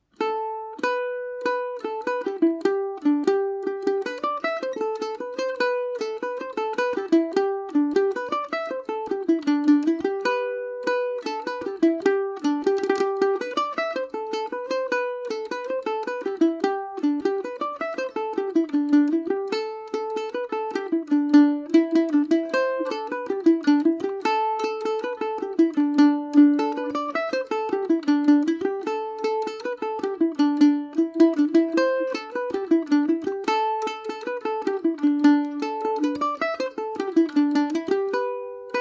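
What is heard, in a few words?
G major, mandolin; Paris Swing Macaferri mandolin; The Horseshoe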